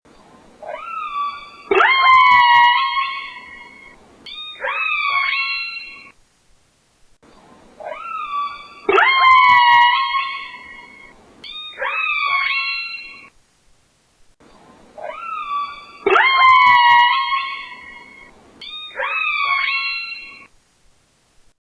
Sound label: Orca; Jim Nollman